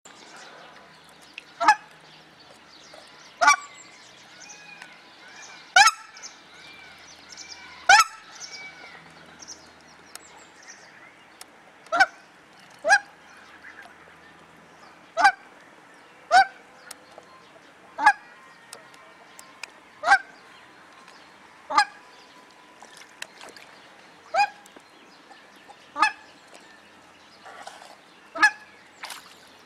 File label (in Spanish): cerceta baikal